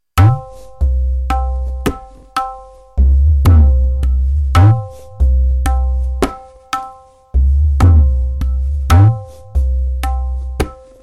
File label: kaharwa vilambit 55bpm